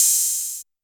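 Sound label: TR808 open hi-hat, Roland SH-32 Drumset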